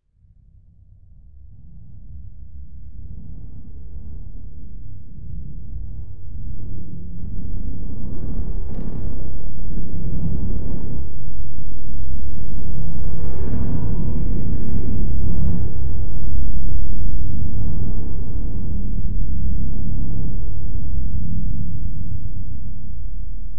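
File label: Dungeon Sound Scary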